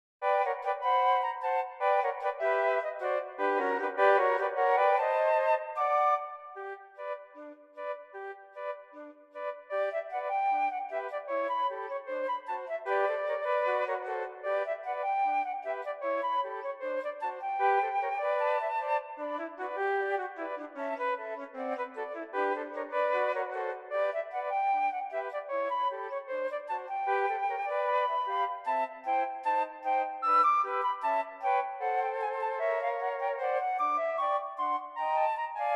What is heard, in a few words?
Ragamuffin 3 Flutes